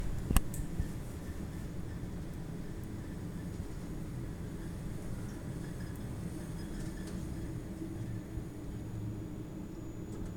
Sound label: Elevator Sequence Ambience Door Opens Closes Descends Door Opens